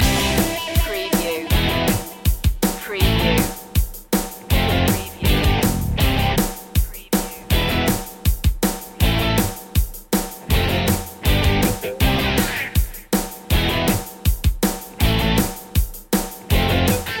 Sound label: punky loop